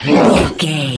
troll monster attack fast

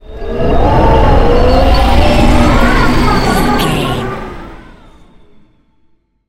wind blizzard storm spell power

